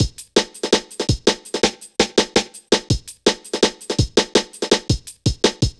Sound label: Drum Loops, Jungle